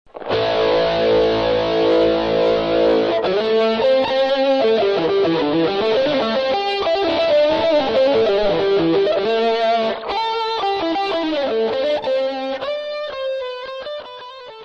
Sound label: basic box lick